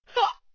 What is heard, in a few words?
grunt; Becca